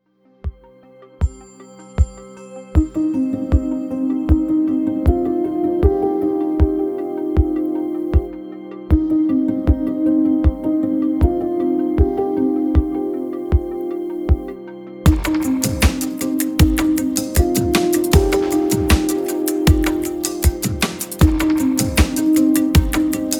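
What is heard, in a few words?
Music Toolkit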